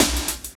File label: loop, Roland; Sound & Vision - Gigapack I